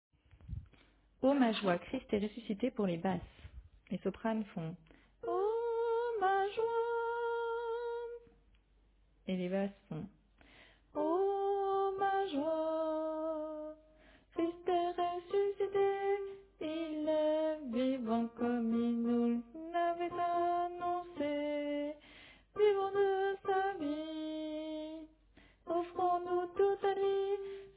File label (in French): Basse; St-Jean-Baptiste-de-la-Ste-Famille